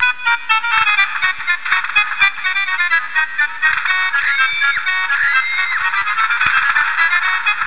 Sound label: carnival, ambience